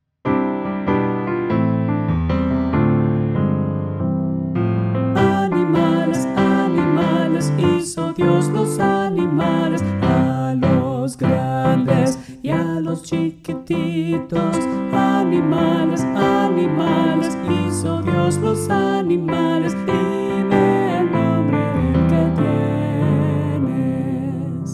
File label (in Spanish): Animales animales